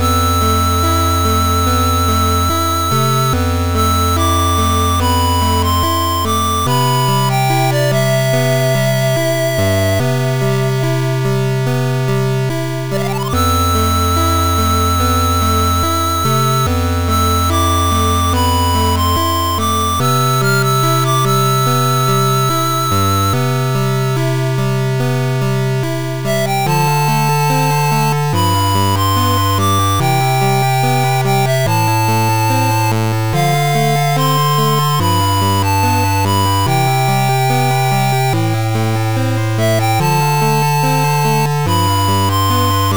8bit

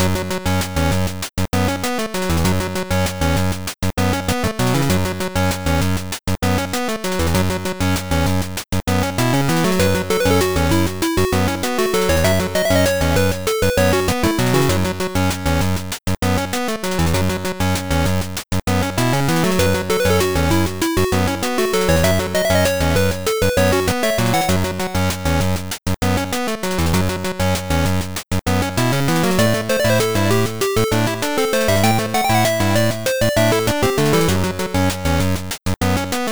8bit Into The Jungle

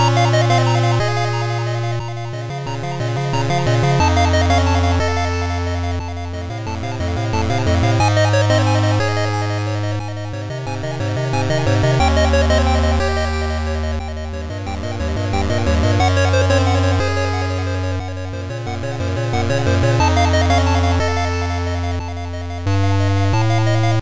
8bit In The Cave

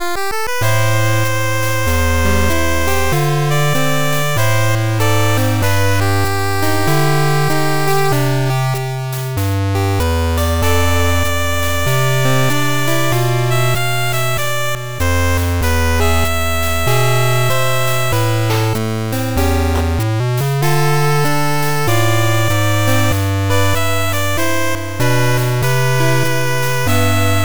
8bit

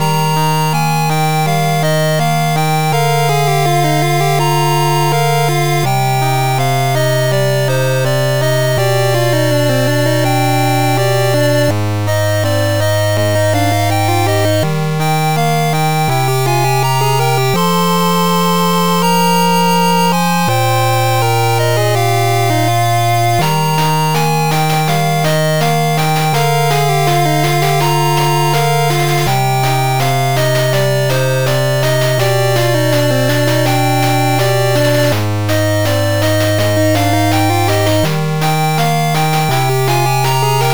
8bit Peaceful Palace

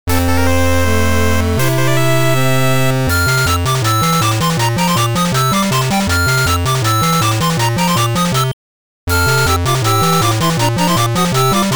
8bit Happy Victory

